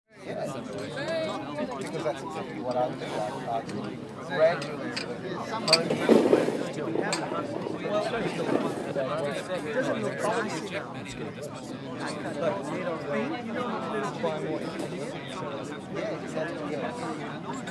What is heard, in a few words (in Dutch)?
Barbeque; KMP Film Video Sound Effects; vrije tijd